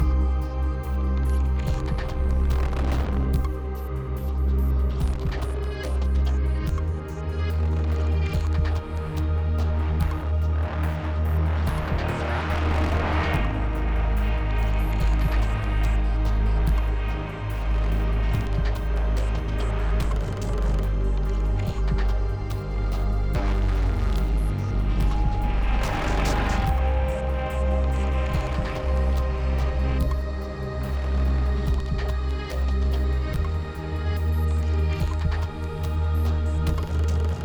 Exploration, 72BPM

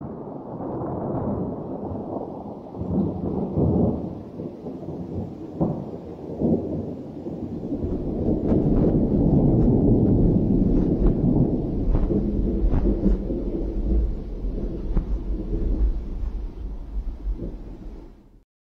Thunder; besiege

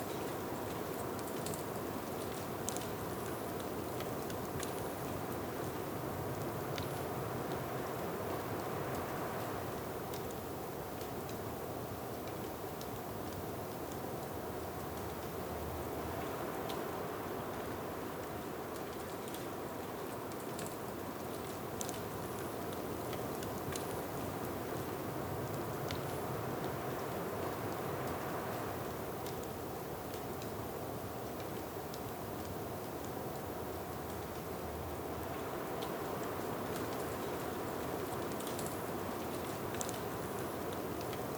Snow Storm; Munin

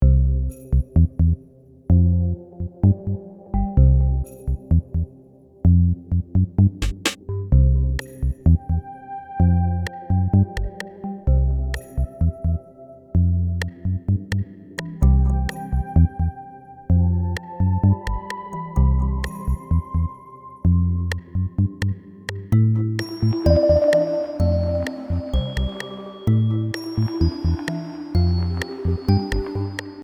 Christmas Theme Song; Pixel Starships

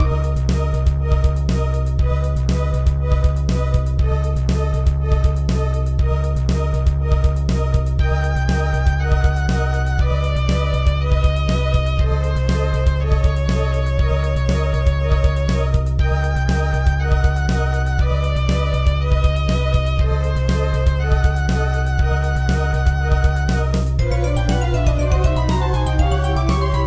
Horror; Yuru Yuru Gekijou Gekijouban 2 3

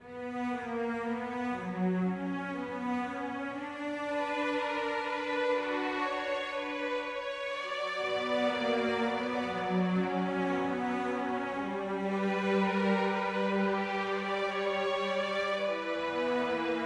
adagio loop; noahmund

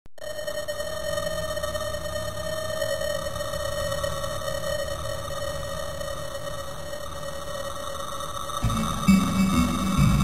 ambience; Mario 85 Demo; iOS, Linux, Windows; Mario 85 - MX ambience

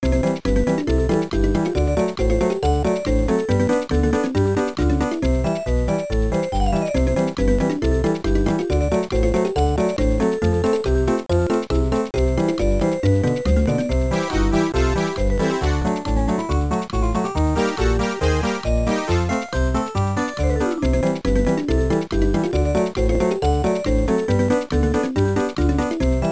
Level Complete; Rugrats Castle Capers; GBA

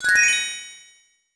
Correct; lego my style kindergarden

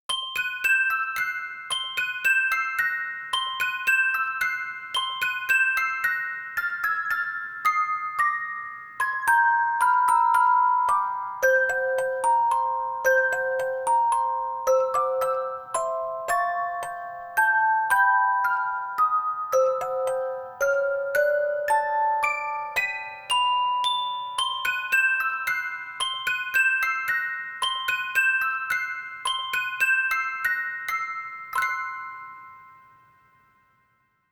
Music Box; um jammer lammy original soundtrack

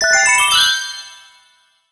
Collect All Power Moon Shards; Super Mario Odyssey

